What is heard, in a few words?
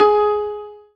ZIMBAL G#3, ZIMBALON